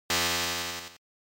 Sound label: General Noise Single